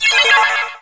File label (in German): Fehler; FRITZ!